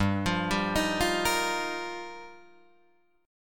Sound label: G Minor 7th Sharp 5th, Guitar, Drop D